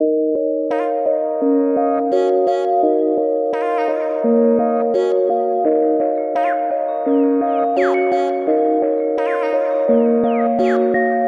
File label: Nostalgic Melody Loop 170 BPM D# Min; Cymatics; Oracle Sample Pack